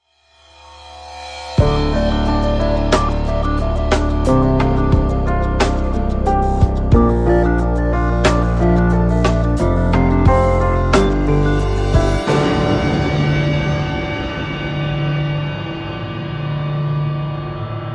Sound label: Bumper